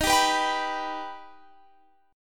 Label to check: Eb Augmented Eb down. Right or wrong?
Right